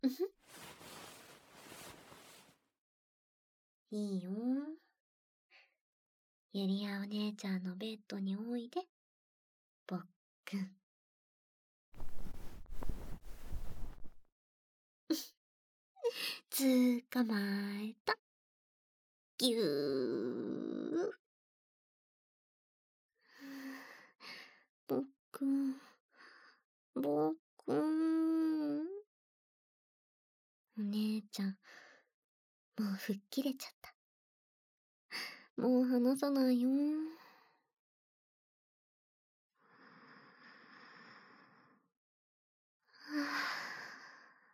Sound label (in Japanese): 綿棒耳かき, ASMR; お姉ちゃん大コーフン☆